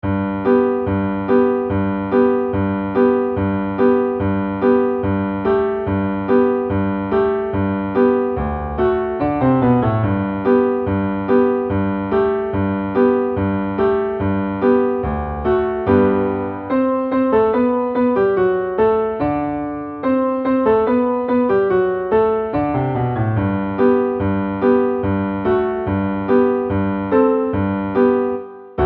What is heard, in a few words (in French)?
polka, basson